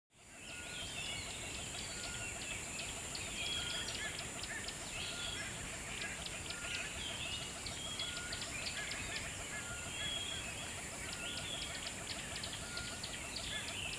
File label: sounds of the river and birds